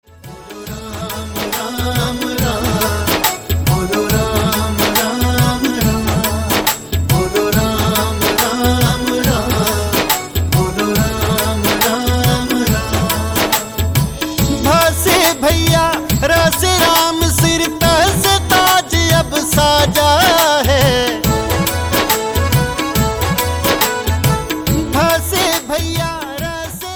Bolo Ram Ram Bolo Ram Ram Ringtone

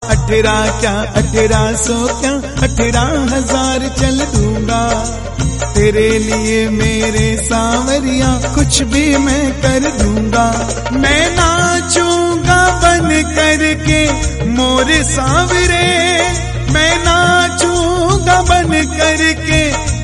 KRISHNA Bhajan

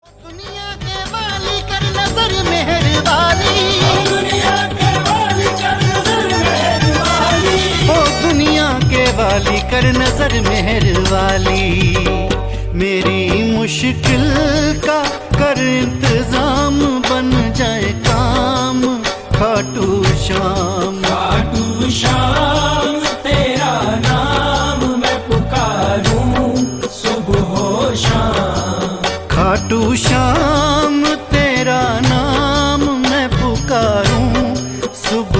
Bhajan Ringtone; Khatu Shyam

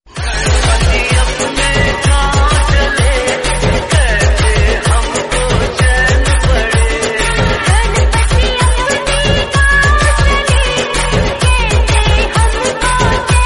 Dj Remix

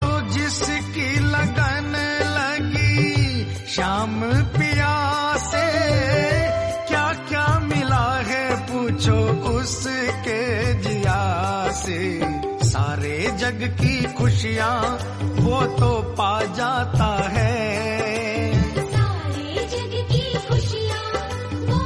KHATU SHAYAM Bhajan

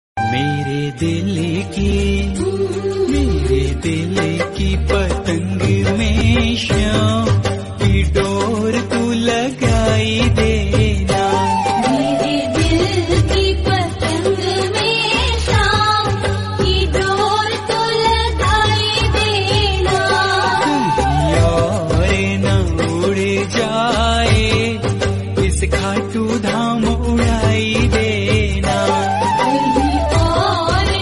Bhajan Hindi

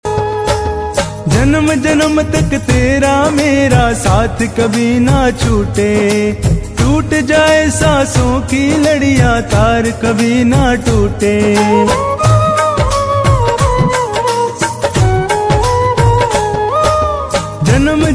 Khatu Shyam Bhajan

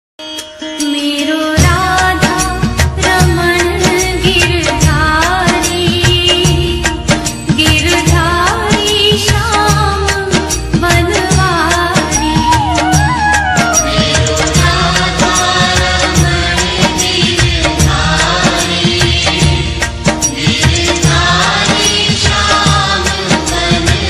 Bhakti Ringtone Krishna bhajan Ringtone; Radhe krishna

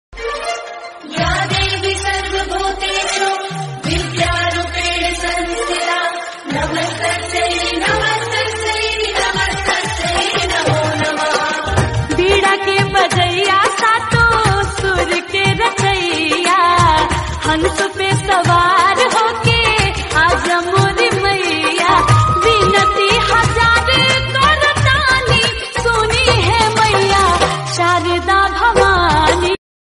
Ya Devi Sarvabhuteshu Bhakti Song

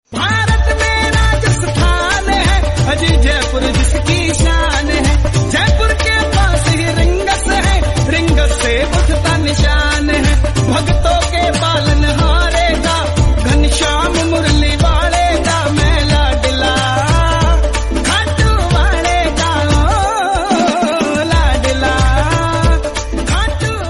Devotional Bhajan Hindi Ringtone

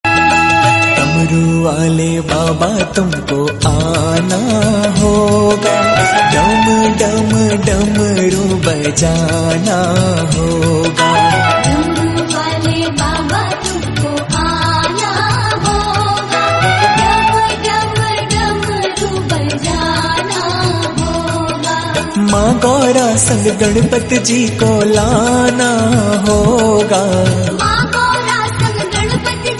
Devotional Hindi Ringtone

